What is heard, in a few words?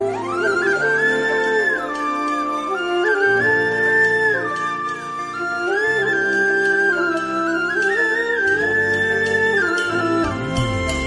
most romantic flute